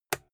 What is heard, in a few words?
old switch plastic robust